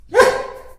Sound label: bark